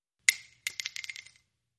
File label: Shell Case Sngle Tube, shell casing movement